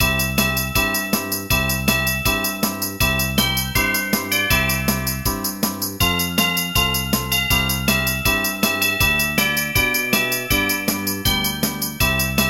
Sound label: jingle bells novyi god